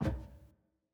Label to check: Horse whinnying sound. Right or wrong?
Wrong